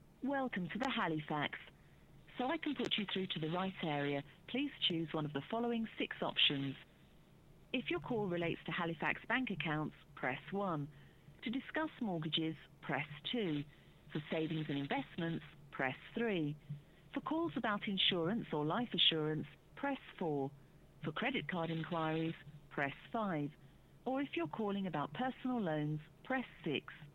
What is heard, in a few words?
Xperia S front speaker